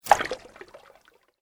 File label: Sweep Organic Fast